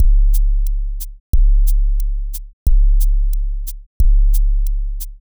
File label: drums 808 open